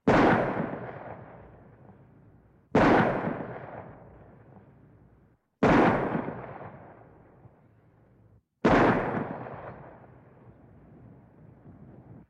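vistreli iz pistoleta snaruzhi srednee rasstoyanie, pistol shots outside, medium distance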